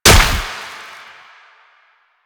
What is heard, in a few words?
Proc Explosion; Mods